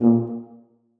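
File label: tuba staccato a#2; Sonatina Symphonic Orchestra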